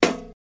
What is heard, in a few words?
Bingo Tap Single